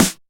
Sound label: Sharp Snare Sample G Key, snare drum sound tuned to the G note; snare drum samples